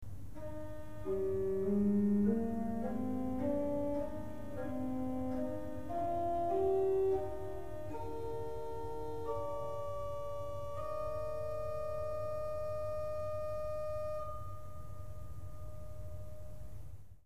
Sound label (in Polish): rog kozi